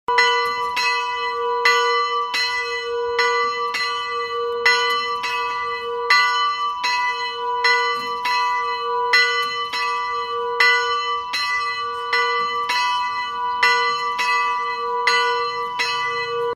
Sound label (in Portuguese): Sino de 46 kg